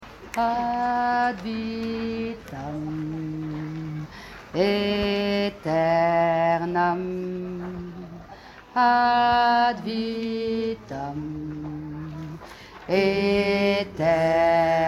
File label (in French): femme du soldat MEDIANE